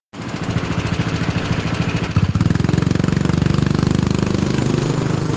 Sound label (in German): Kart